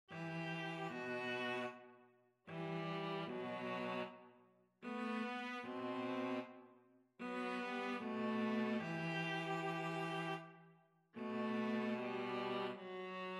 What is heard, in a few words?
viola cello duet; fourseasons